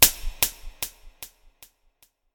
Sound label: snap